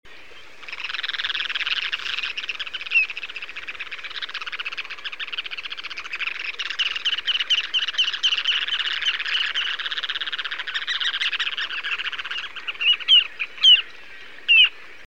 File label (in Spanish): vuelvepiedras comun